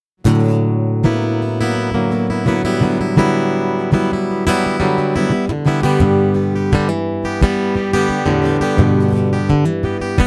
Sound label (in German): More Bass mit Kompressor